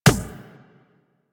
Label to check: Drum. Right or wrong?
Wrong